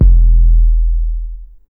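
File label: 808 rap kick; Drums In Your FACE!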